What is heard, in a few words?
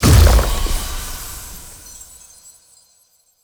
Stomp Impact; Powers; Fey; Founders Champion